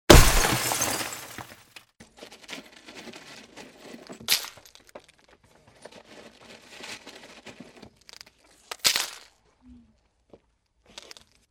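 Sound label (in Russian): разбили боковое стекло в машине и убирают осколки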